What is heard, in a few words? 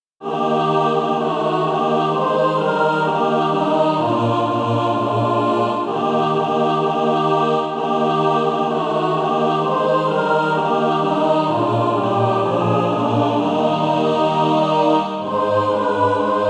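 mixed, Practise Music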